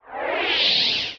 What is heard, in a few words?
Motion Design Woosh; SoundsCrate